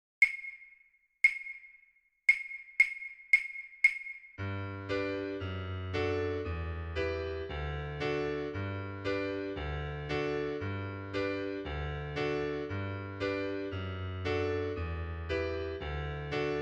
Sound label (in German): Der Osterhas schnell nur Begleitung